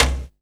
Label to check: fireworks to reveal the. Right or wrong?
Wrong